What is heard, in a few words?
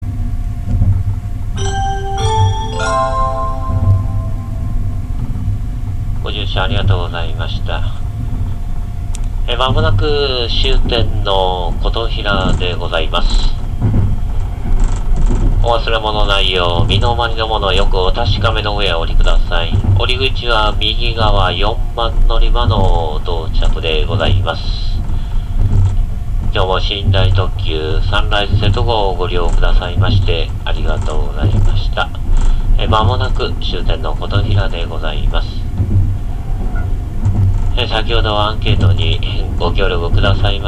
sunrise koto koto